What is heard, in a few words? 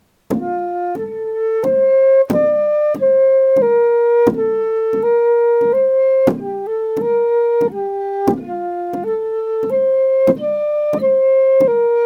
chant